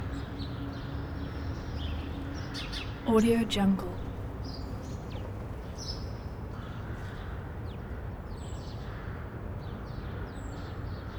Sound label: ambience city birds distant crows